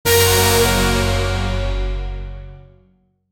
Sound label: critical tension cinemati